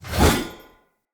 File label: Great Sword Whoosh; Weapons; Player; Mendicant Great Sword